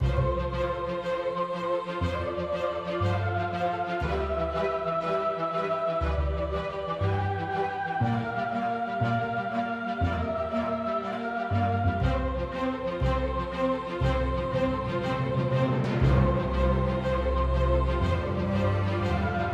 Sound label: Background Loop; genesis; clash Of Queens